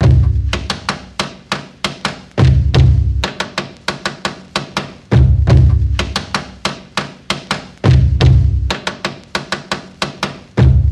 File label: Desk Slap Drums; Kount's Home Stretch Sample Pack